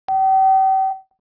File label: floor, tones; ambient